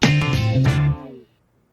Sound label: car; birdman